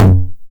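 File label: 909 low tom, tape saturation; Goldbaby Tape808 & Tape909; The Tape 909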